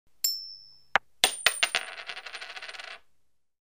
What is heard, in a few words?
Throwing coins on the table